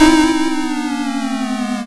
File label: YM Glitter; SFX; ChipShop Deluxe; ChipShop Hits